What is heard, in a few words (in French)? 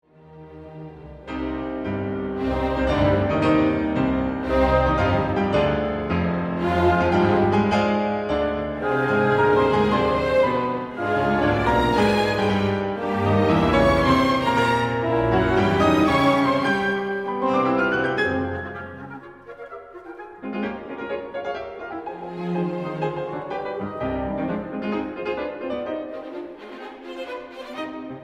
fantaisie pour piano et orchestre, allegro moderato